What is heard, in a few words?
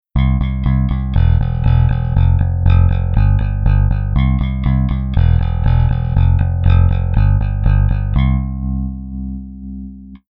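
bass effector tremolo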